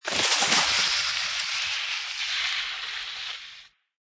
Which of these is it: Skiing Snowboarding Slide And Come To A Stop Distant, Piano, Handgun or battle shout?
Skiing Snowboarding Slide And Come To A Stop Distant